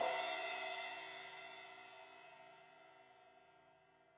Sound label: Crash 16in edge, Cymbals